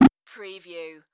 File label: Digital Alert Obnoxious Error Short